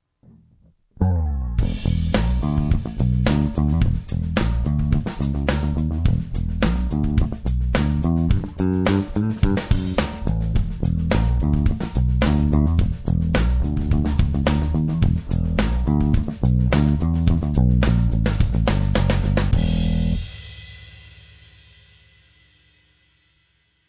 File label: Epiphone Thunderbird IV groove